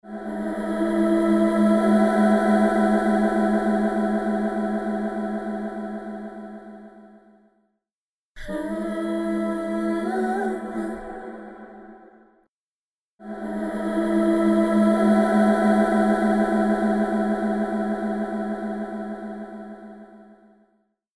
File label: Vocals; Undulator